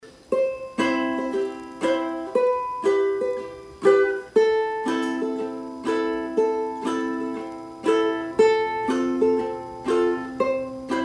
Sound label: ukulele